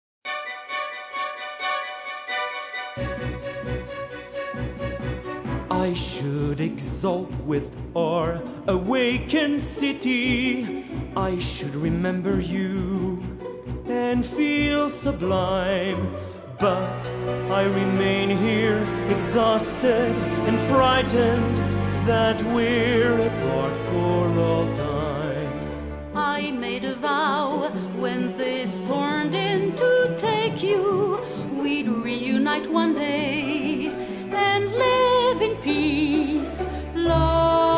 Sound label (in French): Voix chantee en anglais, Duo, MUSICAL THEATER, Voix chantée, personnage en anglais